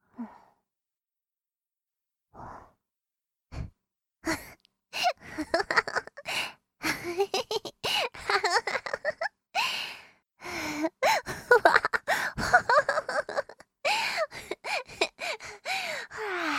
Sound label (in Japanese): 笑い声